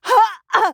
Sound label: Mage Grunt Hit; Kunoichi; Realm Royale